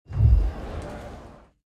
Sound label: Hotel Entry